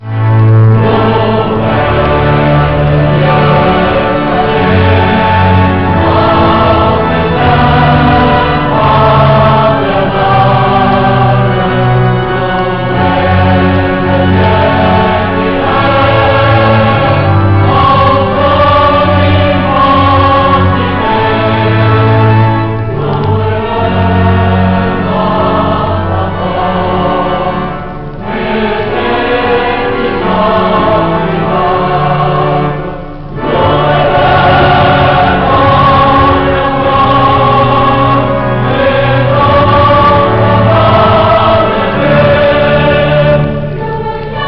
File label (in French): la chorale : la fin du psaume 150; orgue cath oran; de César Franck